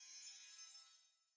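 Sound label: video button hover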